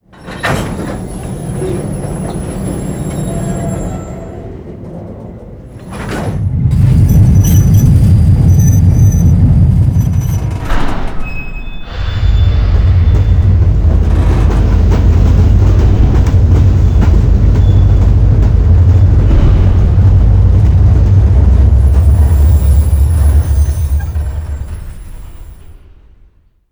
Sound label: Harvester Silo Reverse; moul